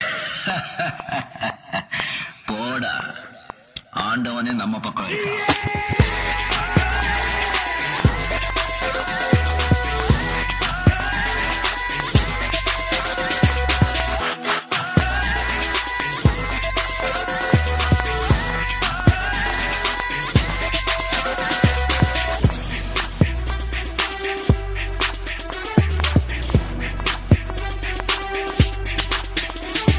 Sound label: Dubstep; Rajini